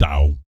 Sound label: BASS FX, Vocal Planet, Jazz FX; Spectrasonics